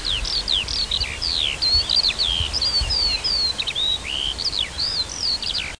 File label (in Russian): polevoj zhavoronok alauda arvensis